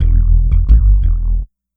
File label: Synth Bass; Bass X